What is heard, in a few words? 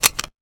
weapon foley pickup; Real Guns Sound Pack 2; Mono